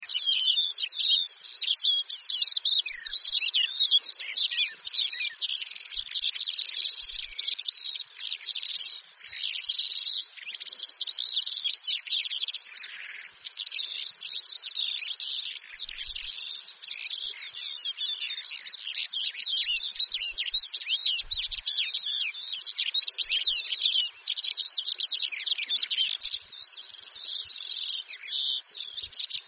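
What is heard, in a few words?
stepnoy zhavoronok voice, calandra lark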